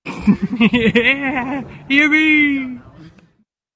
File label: yibbee